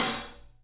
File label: Studio Hats, 3DPercussives